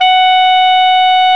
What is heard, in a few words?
Clarinets